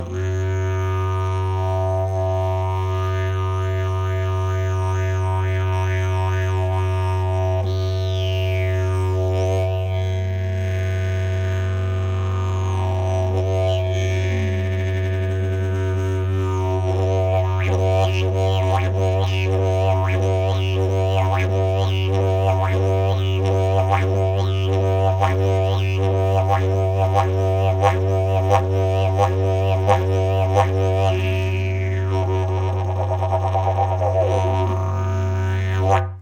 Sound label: didgeridoo; jasan rezboles; 32 mm; Ladění : Fis; Tomáš Dufek